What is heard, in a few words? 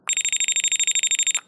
horn